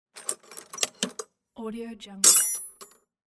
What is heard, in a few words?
dropping tools in toolbox